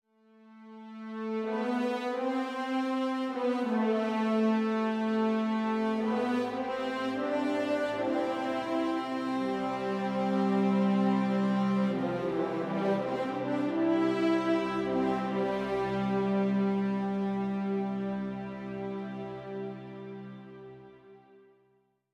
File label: music interactive; World of Tanks